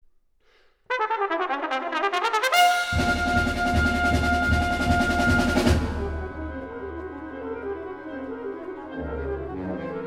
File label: Concerto for Trumpet Wind Ensemble, Allegro calmando; Concerto No 2